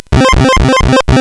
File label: Deviants, CSG8580R5